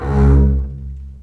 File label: String Collection, MARCATO; East West - The Ultimate String Collection